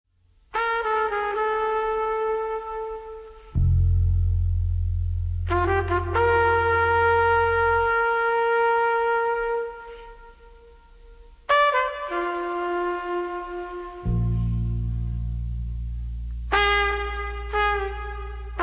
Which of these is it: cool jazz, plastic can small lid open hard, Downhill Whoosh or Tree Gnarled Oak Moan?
cool jazz